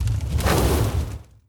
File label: Attack Magician